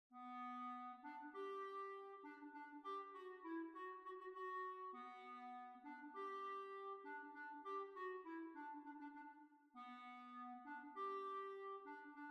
French Quadrille

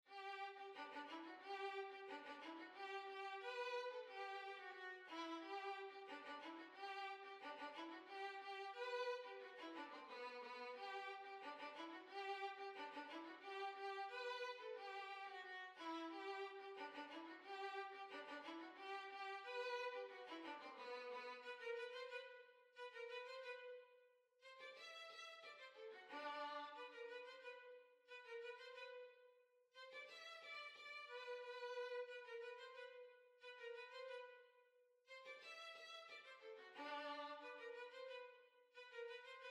Violin

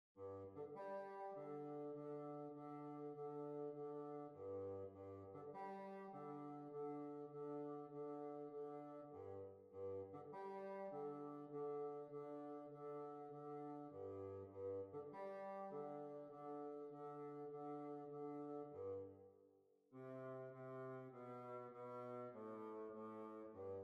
Holmfirth Jig

